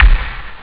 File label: big bdrum; Amiga Soundtracker Sample Packs